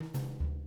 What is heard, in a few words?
110 LATIN, Living Drums; Sampleheads; Peter Erskine; JZ 110 LATIN